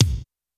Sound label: kick, Roland R-70, BD